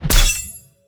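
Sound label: attack sea sword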